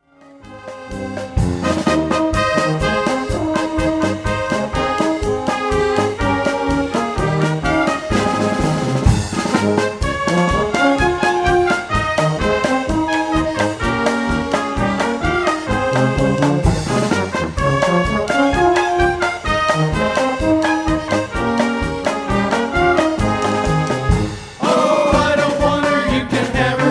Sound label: Polka